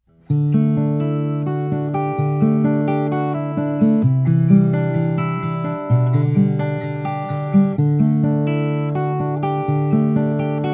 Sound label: Taylor gs mini e se fingerstyle